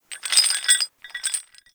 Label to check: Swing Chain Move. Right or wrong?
Right